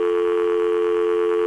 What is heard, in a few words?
no connect; TeamAlligator